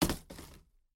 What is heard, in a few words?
impact; The Loud House - Extreme Cardboard Racing; NextlevelGames